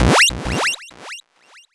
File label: Young Gal A Scream; Fx; Loopmasters; Jon Carter & Alex Blanco; Block Party Essentials